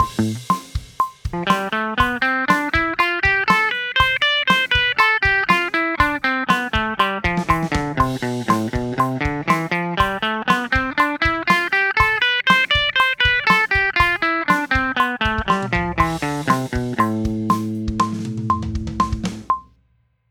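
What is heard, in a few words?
Am Aeolian bpm120 eighth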